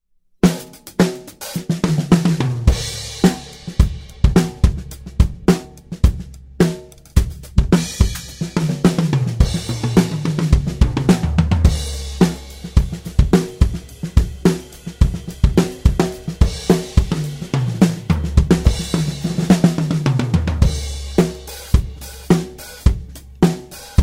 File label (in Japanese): 生音 サンプル; antelope audio orion studio; 生音サンプルオライオン